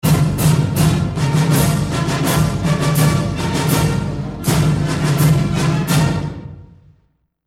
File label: Legion march